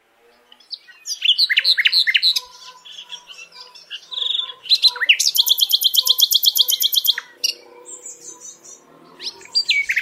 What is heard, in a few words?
nightingale